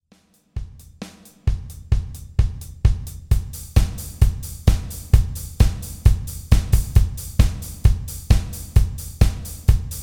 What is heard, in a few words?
drums